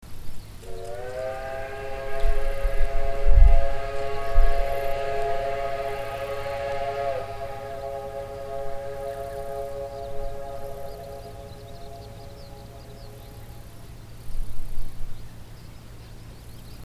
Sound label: 8Bit